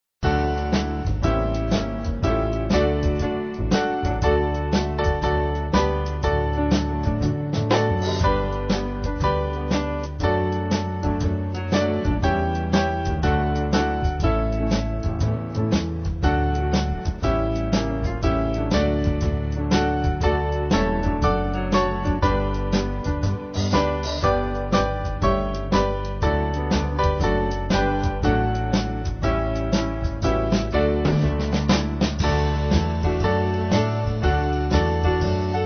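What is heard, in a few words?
Band, Music Backing Files for Churches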